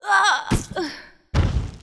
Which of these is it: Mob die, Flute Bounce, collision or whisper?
Mob die